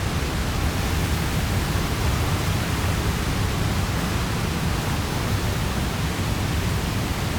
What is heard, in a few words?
waterfall; CrossCode; background